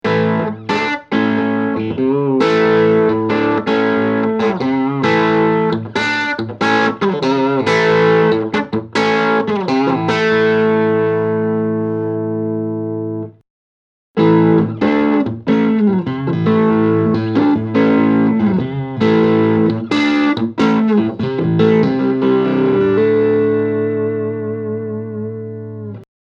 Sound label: fender ramparte